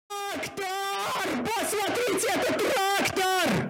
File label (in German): traktor